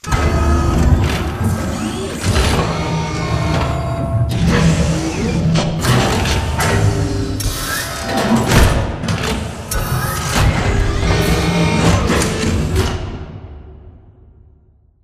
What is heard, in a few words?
Assembly Furniture Arm Close; Boomtown; Titanfall 2